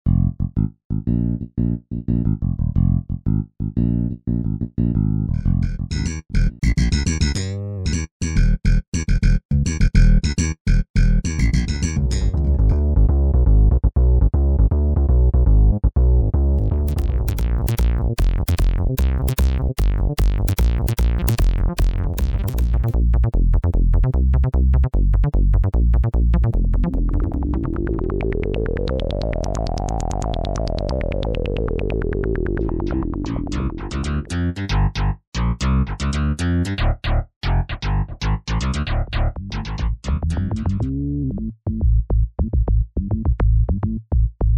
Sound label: Bass